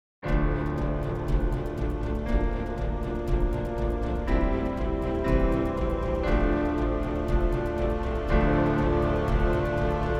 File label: EG Montage Orch